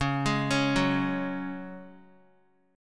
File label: Db Suspended 4th Db, Guitar; arpeggiated